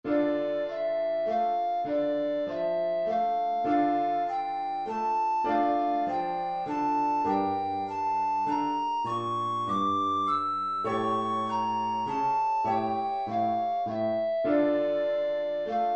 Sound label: scale study in a minor key; lewis alastair